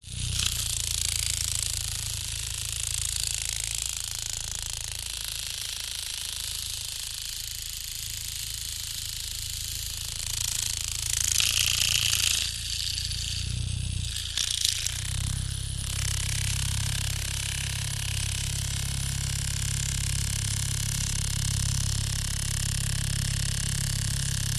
science fiction micro flying drone helicopter propellers flying; zapsplat